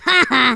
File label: LAUGH, Angry Scots; Speech; Worms Armageddon